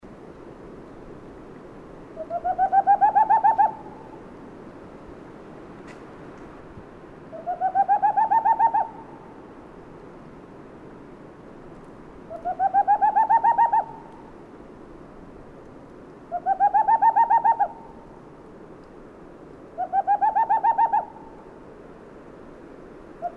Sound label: Aegfun, Sound recordings of owls; Helmipöllö 19.5.2003 Sonkajärvi; Tengmalm's Owl Aegithalos funereus, 19 May 2003, Central Finland